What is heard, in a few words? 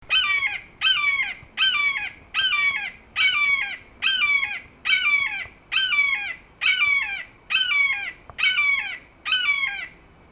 Red shouldered Hawk Buteo lineatus